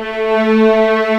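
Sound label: viola arco A3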